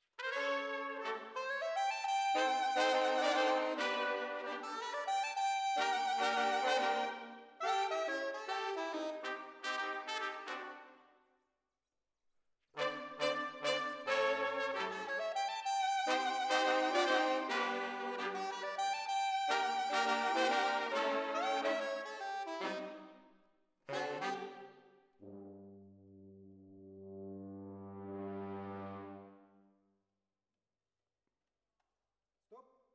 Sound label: ORTF, Jazz for piano